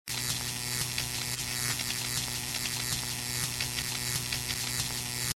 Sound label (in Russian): shypenie lampy fluoresc